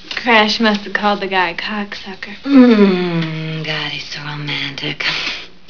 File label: romantic